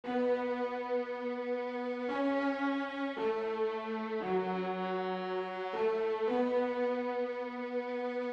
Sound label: Strings, 115bpm; Zero-G; Nu Disco; Construction Kits